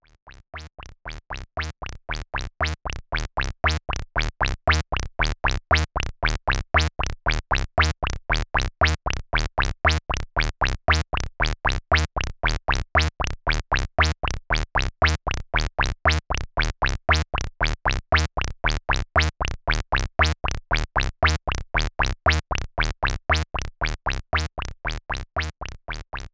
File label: Res Low Pulse; an200